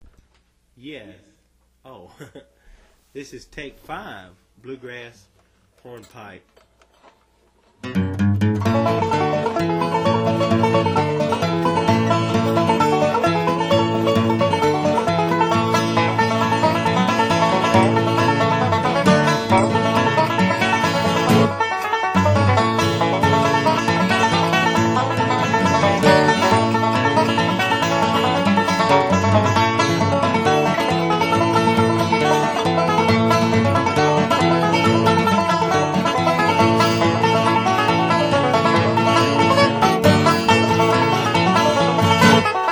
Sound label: Bluegrass Hornpipe